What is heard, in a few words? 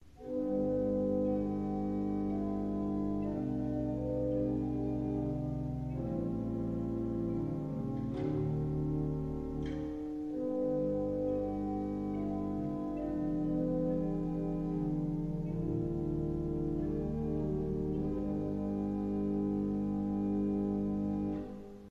pipeorgans; Mertel